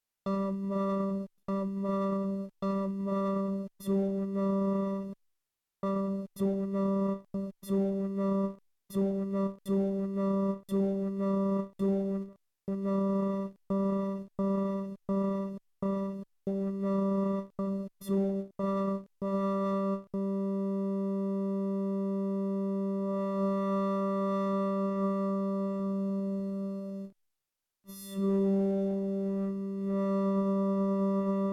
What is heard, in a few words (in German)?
TE PO 137 Sample Start und Speed